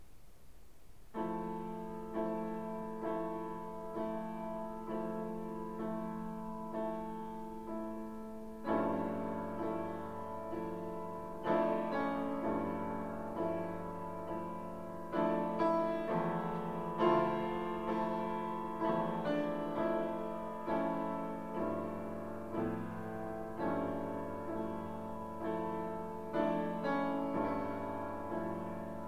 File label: Indie Ballad; Albumleaf 23